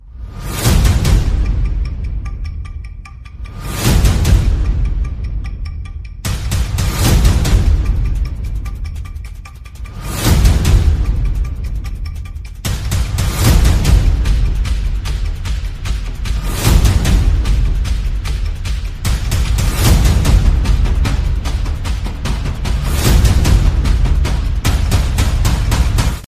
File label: EPIC War Drums